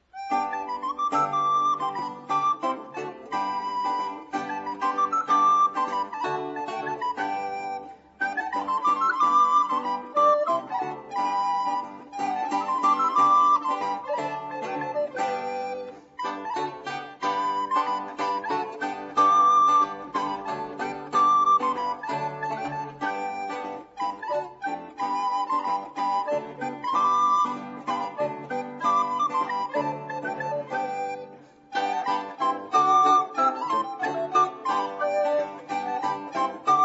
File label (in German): musik